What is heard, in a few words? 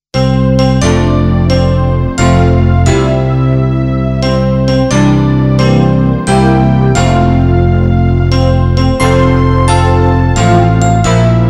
JINGLES HAPPY BIRTHDAY